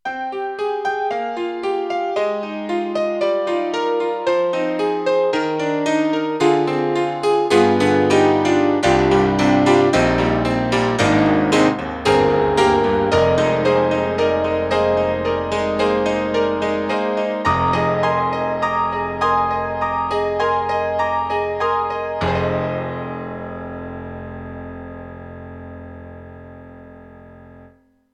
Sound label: Honky-Tonk Piano; SUZUKI; BH-1000